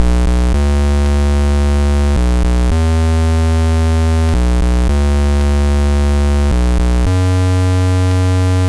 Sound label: Apple II Bass, Chiptune